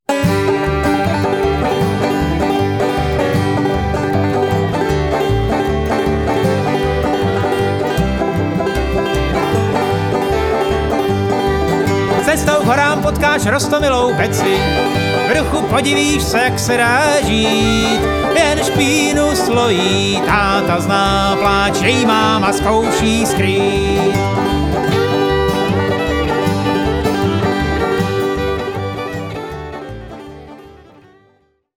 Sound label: banjo